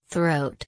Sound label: throat